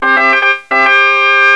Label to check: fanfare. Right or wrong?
Right